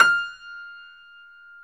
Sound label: F5 YAMAHA R, GRAND; NS GRAND